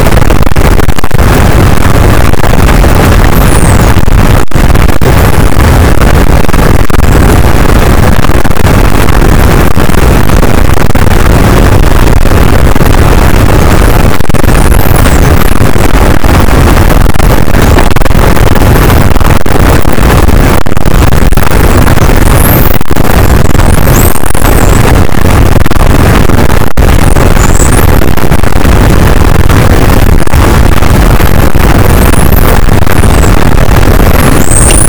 cachalot negatif, boat, Physeter Macrocephalus

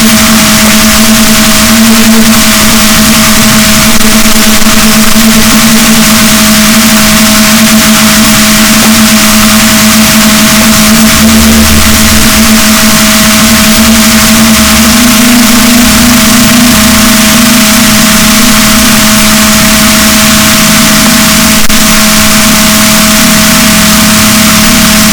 rorquals, boat, Balaenoptera Physalus; SNR 18dB

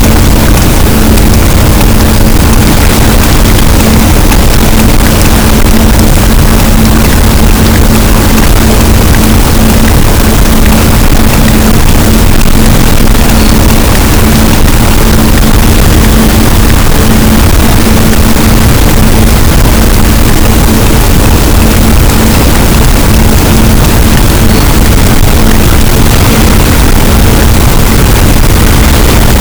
cachalot, Physeter Macrocephalus, gaussian noise

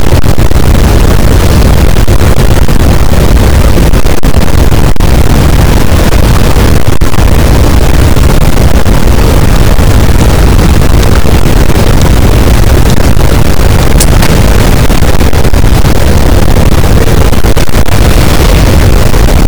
cachalot positif, boat, Physeter Macrocephalus

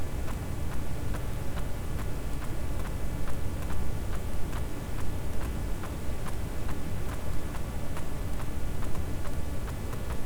cachalot positif, Physeter Macrocephalus

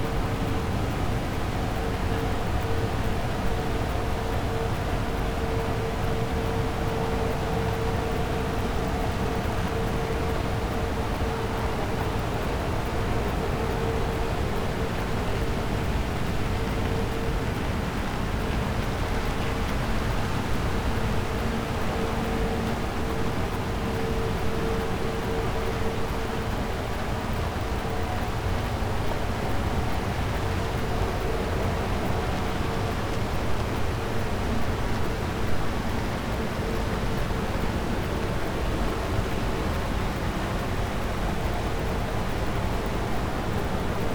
cachalot negatif, Physeter Macrocephalus

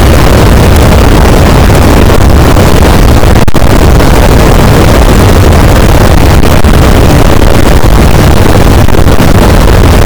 cachalot positif, boat; Physeter Macrocephalus

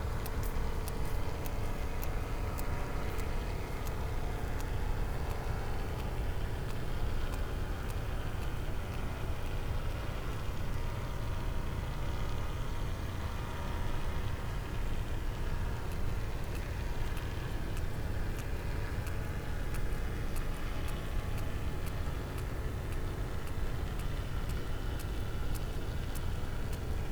cachalot positif, Physeter Macrocephalus

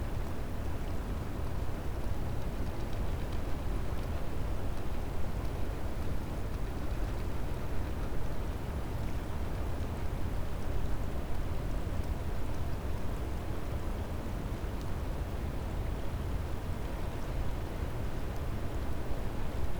cachalot positif, Physeter Macrocephalus

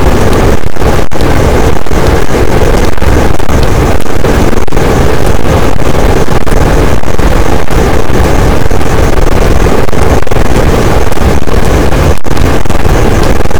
cachalot positif, boat, Physeter Macrocephalus

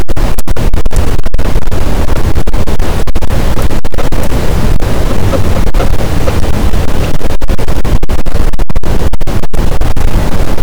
cachalot positif, boat, Physeter Macrocephalus

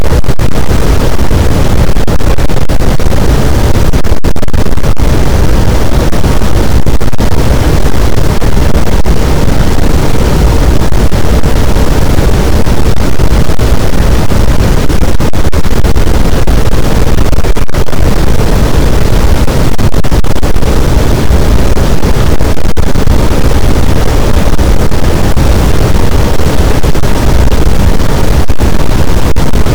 cachalot, boat; Physeter Macrocephalus

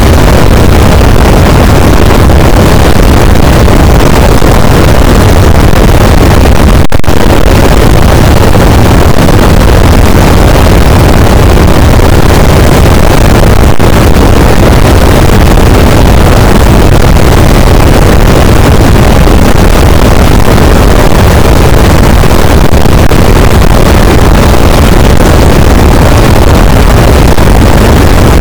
cachalot positif, boat; Physeter Macrocephalus

